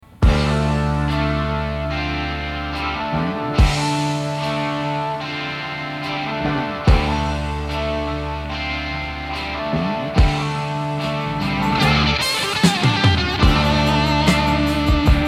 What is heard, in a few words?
Solo; CBS Promo